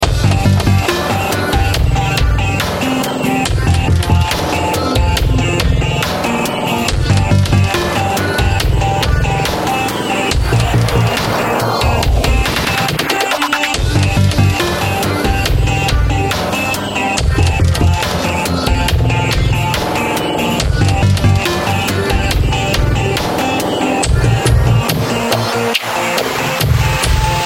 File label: Disto V2 Parallel Master Bus WET